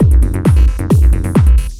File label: beat